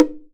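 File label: Percussion Bongo; Drums; Acoustic and Electric; Polyend; Simulacrum Sounds; 1 Shots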